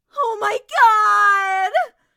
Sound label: town human far; Blind Drive